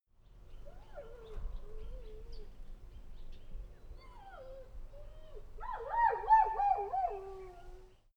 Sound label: dog whimper ambience fall